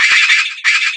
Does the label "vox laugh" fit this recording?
Yes